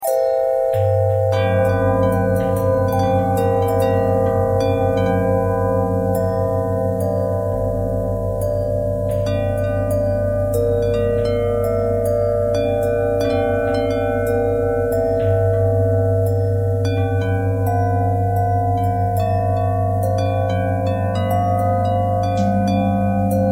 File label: bell harmonic twist